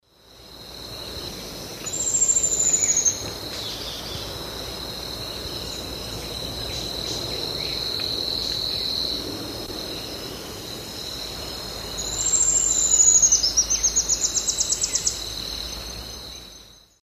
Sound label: Epinecrophylla fjeldsaai, calls; S. America; Eastern Lowlands